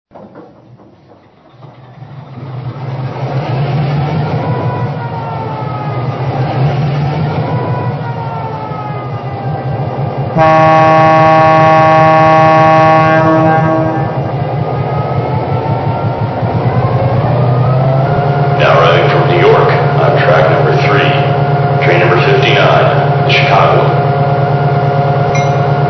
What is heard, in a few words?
E-8